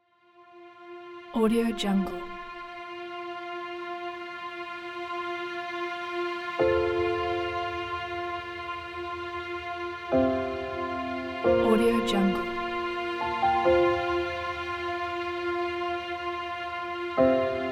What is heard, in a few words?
emotional cinematic documentary drama